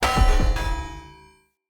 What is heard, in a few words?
Bird Repulsor Destroy Chunk Surface Impact; Objects; Thawtide